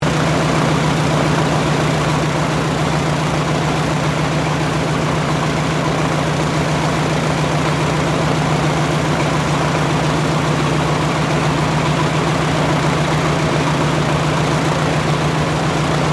AIRCRAFT LOCKHEED HUDSON IDLE, Hudson twin engine light bomber; Airplanes (Sound Effects:Vehicles)